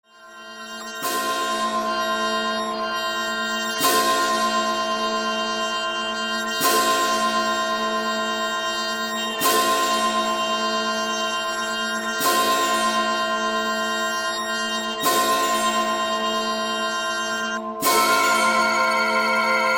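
Church Bells, Live Orchestra Musical Theater Backing Tracks; Aztec Showtrax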